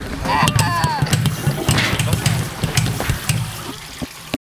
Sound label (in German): Space Sound